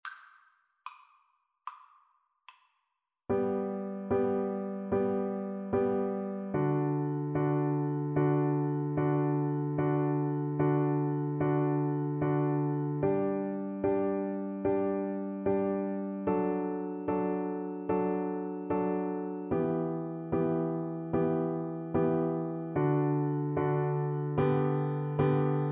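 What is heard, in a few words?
recorder karaoke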